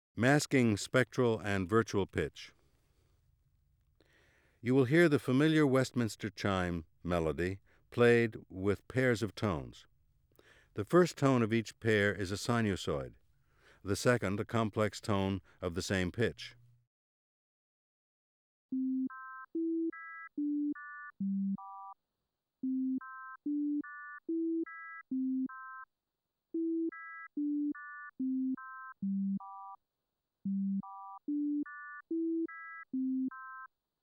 Masking Spectral And Virtual Pitch

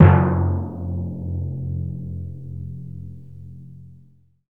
timpani

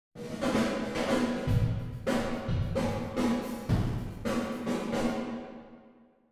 nonoverlap drum

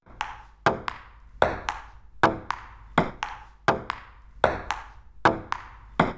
pong

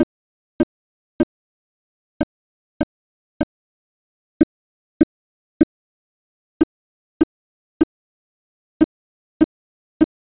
Violin vs Violin

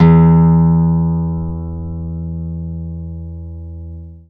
Guitar E3; dsp2008 sound library

